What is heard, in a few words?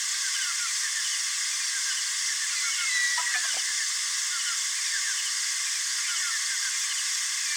jungle; Golden Crayon Sounds